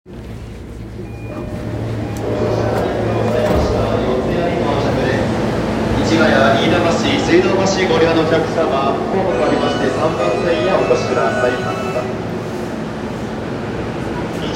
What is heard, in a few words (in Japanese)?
中央線快速四ツ谷東